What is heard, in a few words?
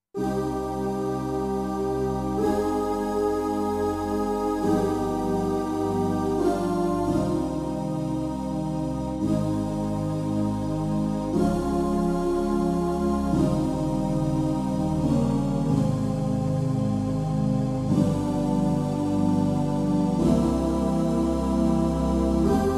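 Choir Pad, digital piano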